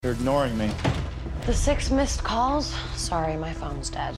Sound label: Jazz